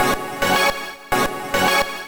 beatbox; Pinball Dreams

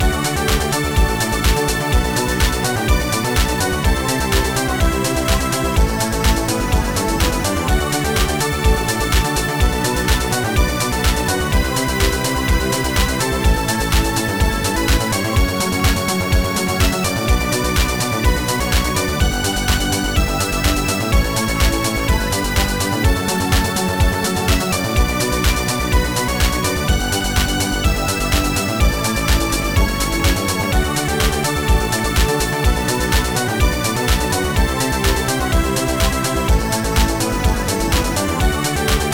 trance

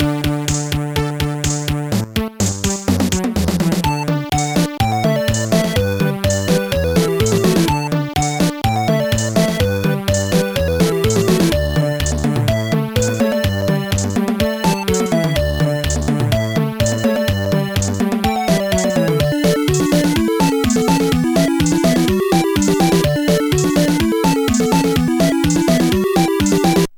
level 1 (forest); Ivanhoe

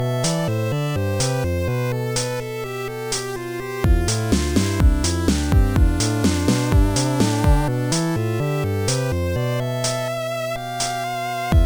painful; MusicLine

